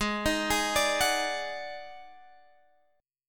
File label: D sharp Minor Major 7th Double Flat 5th G sharp